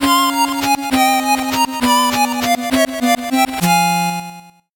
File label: 8bit jingle normal